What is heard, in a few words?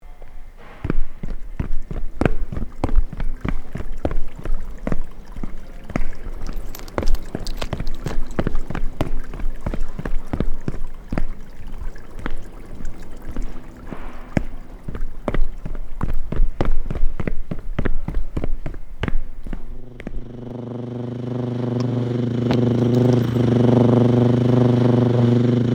Soundscape